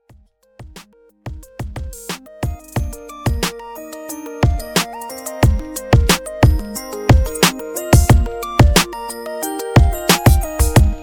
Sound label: Redrum